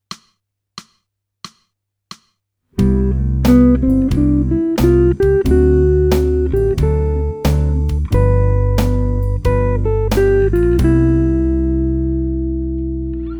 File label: jazz